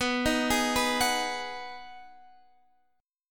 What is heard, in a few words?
B 6th B down Guitar Standard; arpeggiated